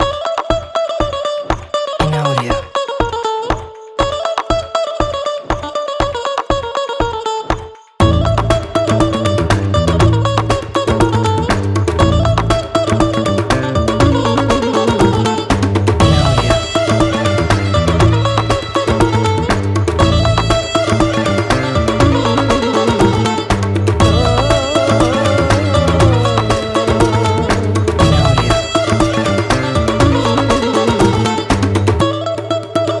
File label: Arabian Pulse